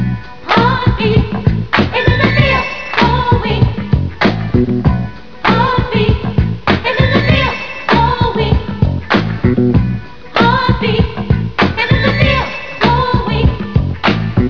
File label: heartbeat